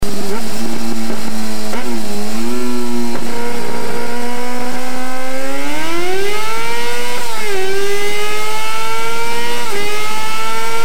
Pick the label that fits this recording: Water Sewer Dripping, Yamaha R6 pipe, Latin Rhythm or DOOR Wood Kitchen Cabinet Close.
Yamaha R6 pipe